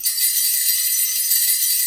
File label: BEL, Orchestral Kit; Roland LCDP03 Orchestral Perc; Orch Combo 1